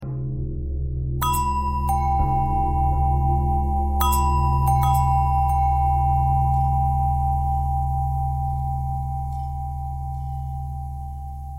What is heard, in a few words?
long bell bell thread simple, bells